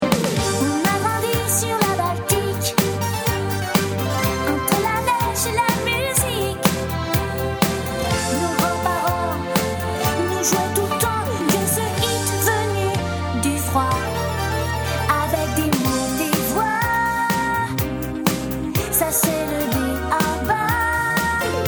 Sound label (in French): voix petite fille chantee